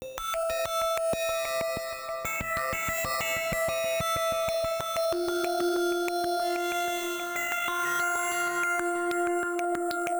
Synth, Hip Hop Exotica, 094 Emin

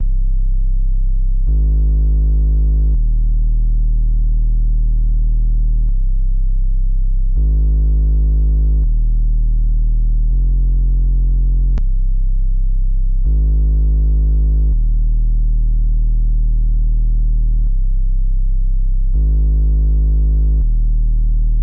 163 BPM C Min Sub; Cymatics; Destiny Gold Expansion; Mirage

